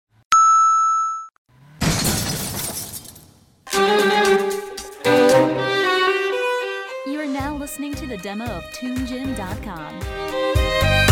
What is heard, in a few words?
Tango; Tunegym